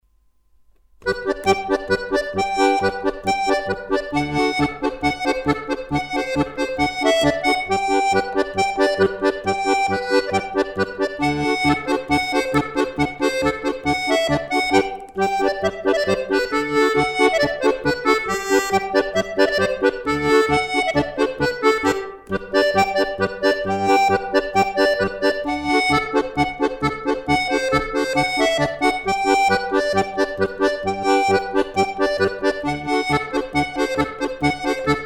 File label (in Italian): polka abruzzese